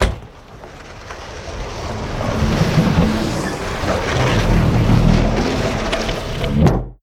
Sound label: Door Close